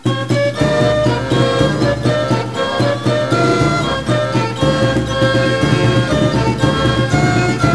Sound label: march